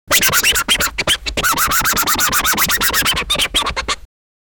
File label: classic record, musical